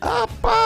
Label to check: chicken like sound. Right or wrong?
Right